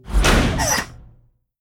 sfx shield; Animation Throwdown

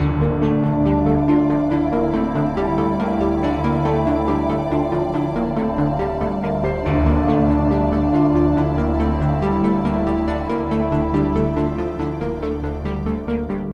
Tragic Loop; Mass Effect

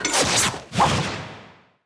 open pack; Animation Throwdown; cardbabies

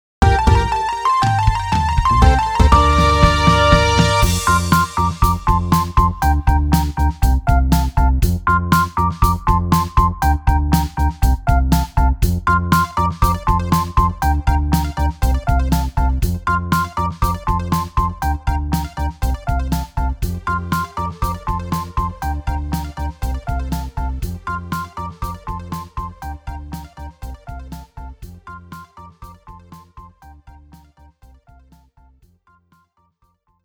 Fanfare; card city nights 2013

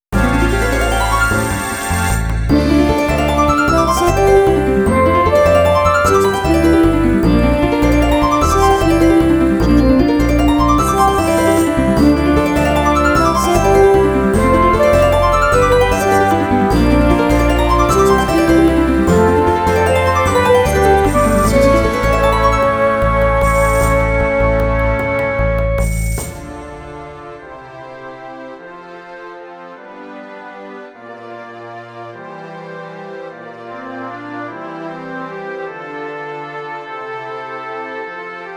Harp Performance

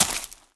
land vines; ice age scrats nutty adventure

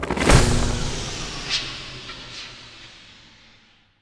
Power Went Out

